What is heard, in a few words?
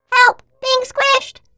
turret squashed; npc; Portal 2